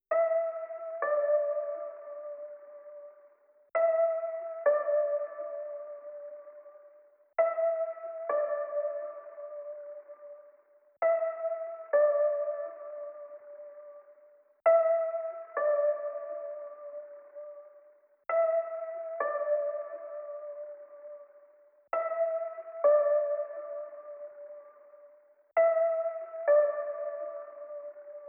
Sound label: 132 BPM F Min Bell; Life